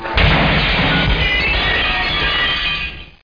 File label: breaking